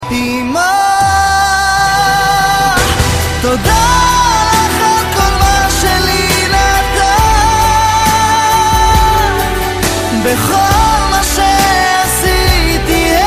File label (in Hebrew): רינגטון